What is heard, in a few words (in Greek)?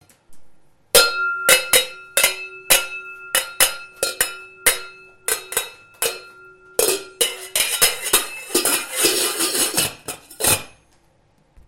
katsaroles